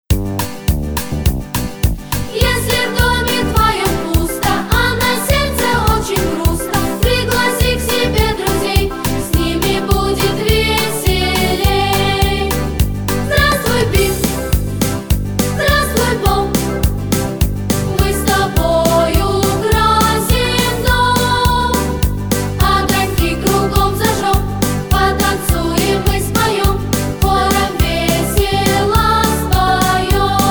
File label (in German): Chor; Tropinka Chor 2009